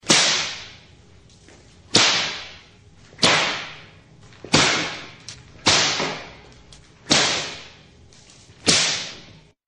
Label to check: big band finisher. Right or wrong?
Wrong